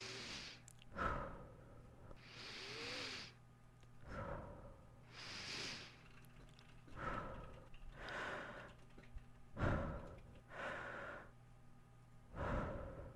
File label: Breathe In Breathe Out